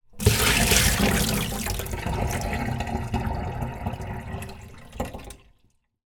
household water pour from plasyic tub into sink drain away